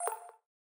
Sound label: graphics digital click; soundscrate